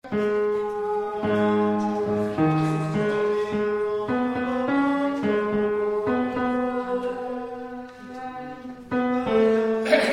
Tenor

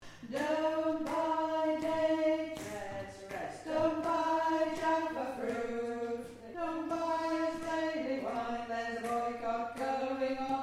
alto, singers

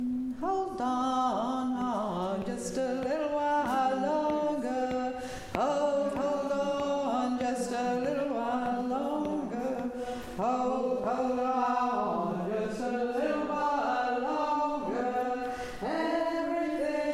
alto

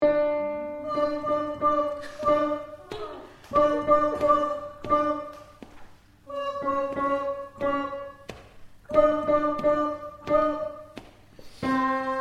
tenor

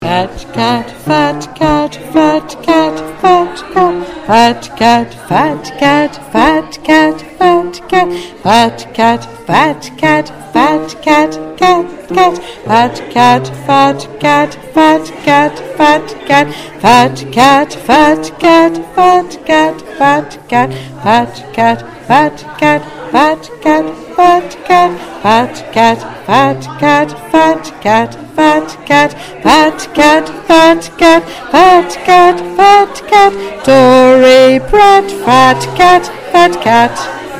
Bass

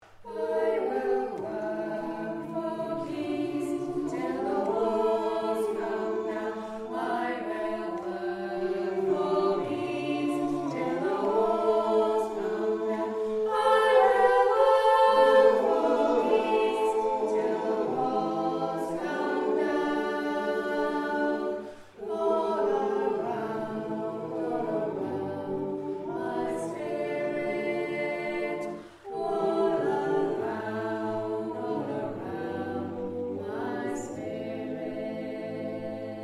Alto, singers

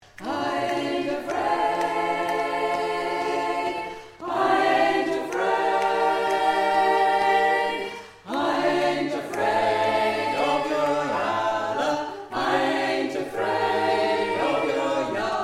i aint afraid all

